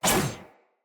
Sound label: Sword Whoosh Heavy; Weapons; Swords; Garren Sword